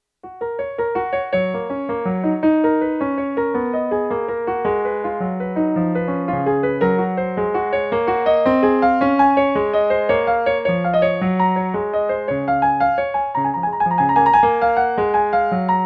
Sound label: bach prelude in f# major; bwv 858